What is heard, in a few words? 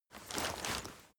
leather metal walk slow single